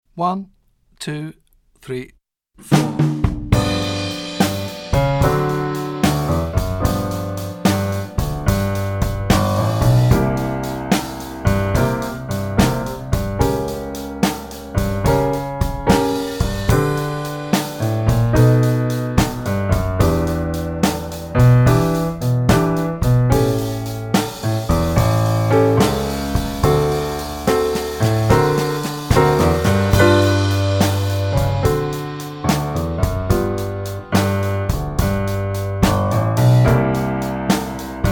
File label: 12 8 Comping Gospel Groove, teclado; jazz iniciante